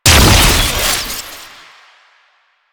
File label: Explosive Impact, Weapons; Warframe; Acceltra Prime